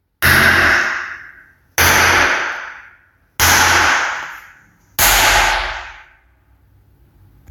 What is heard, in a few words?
Banging Slapping Sound